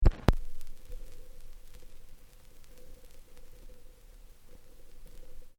musical vinyl needle drop, Turntable Stylus Placed Onto Record; Turntable Needle / Stylus Placed Onto Record Disc Followed By The Crackle And Hiss Of Old; DJ; McKinney Sound